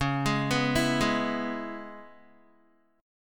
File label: Db Minor 7th Db down Guitar; arpeggiated